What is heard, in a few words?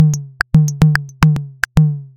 110 Bpm Fm Rhythm